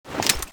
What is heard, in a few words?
g11 draw, weapons